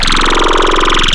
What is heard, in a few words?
Growl Table, Serum; Cymatics